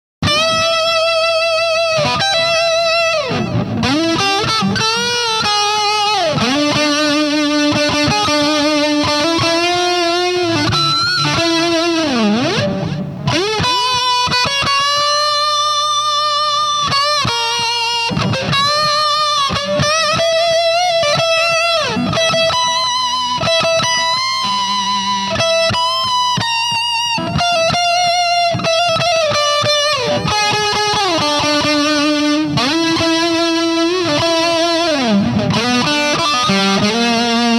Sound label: Hiwatt Eclipse Cab delay; 1973 Violet Rams Head